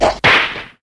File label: whip